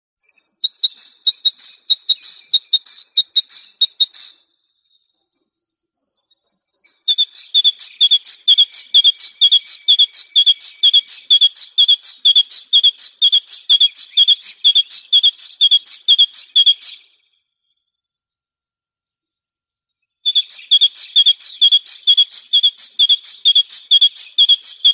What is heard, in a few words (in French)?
chant de mesange